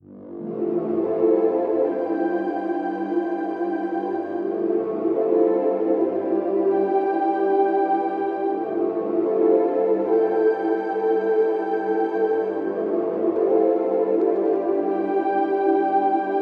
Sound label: Choir In the Clouds; DIVA preset